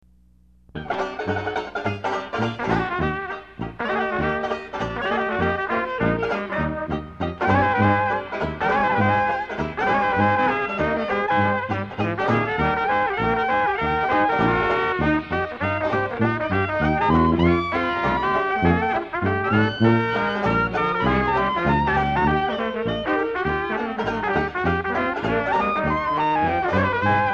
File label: orchestra and bands ragtime